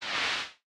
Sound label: robot die vox; fight